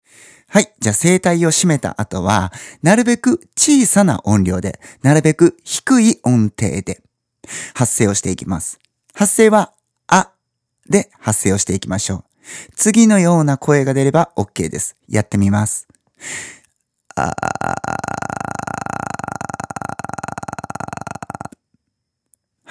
エッジボイス

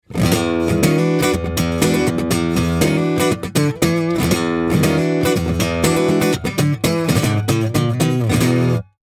カッティング

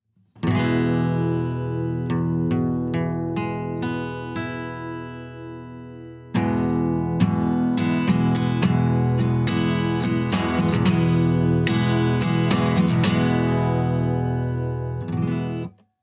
eコード

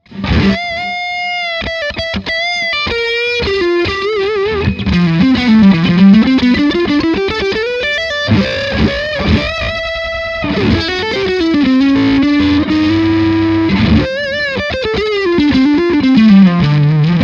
ソロ グレッチ

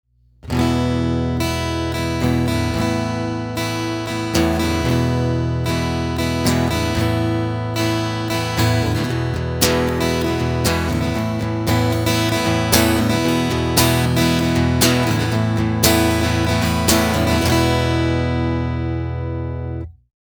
エレメント ストローク

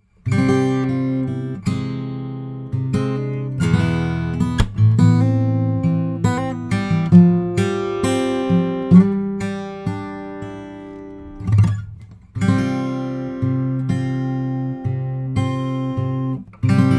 アルペジオ; TLM102

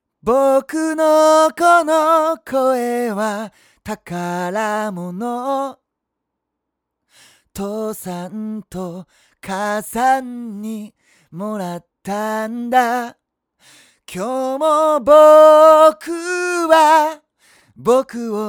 rode 歌 エフェクト無し

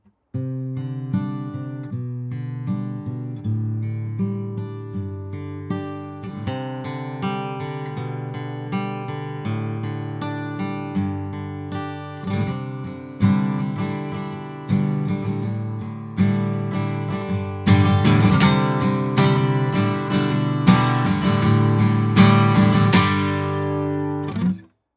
rode アコギ エフェクト無し

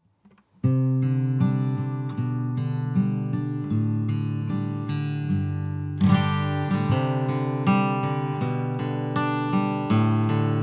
アコギ エフェクトあり

